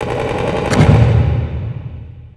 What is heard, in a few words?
inside battle, prison; Half Life Model Pack; Half Life 2; ambient levels